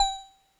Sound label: Drops Kawaii G4; keys